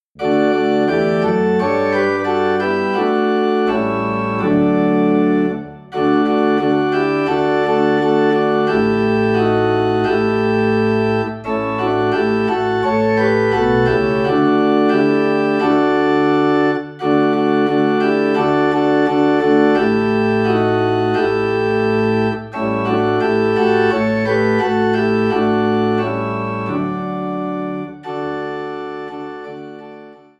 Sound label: Organ in D; Count Your Blessings